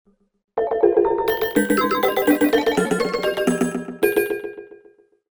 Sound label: Small Critters; Cosmology